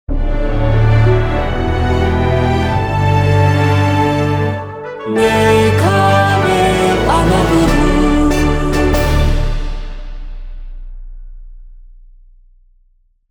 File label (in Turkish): Jingle